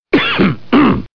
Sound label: Coughing Sms